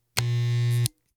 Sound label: electric shaver short on off switch click